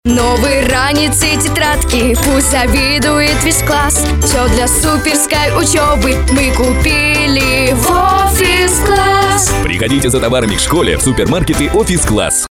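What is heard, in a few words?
vocal; Ofis Klass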